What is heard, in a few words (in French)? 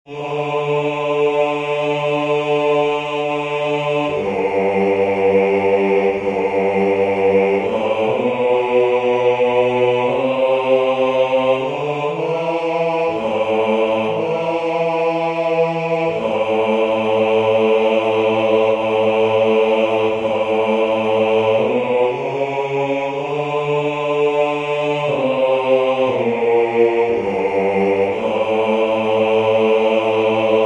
4 verset 7 Bas; Monteverdi; Vespro; Vespro della Beata Vergine (1610); Baroque